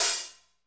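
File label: hi-hat, hi-hat real; Sound & Vision - Gigapack I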